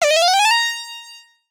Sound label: cast spells, magic spell; 魔法/法术的音效